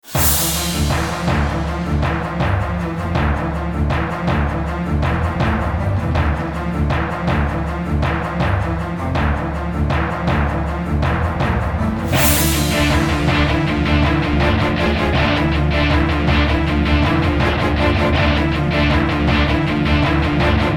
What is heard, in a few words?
Fight; Serious Sam 2